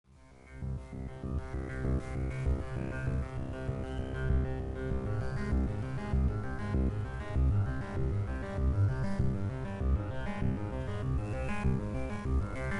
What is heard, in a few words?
Ambience; Red Faction Music